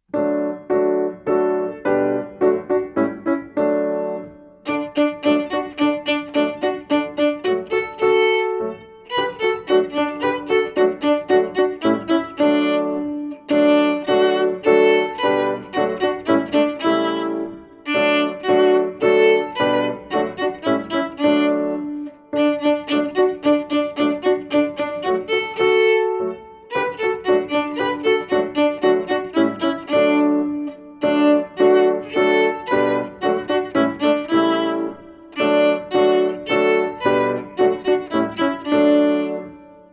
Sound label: rocky mountain violin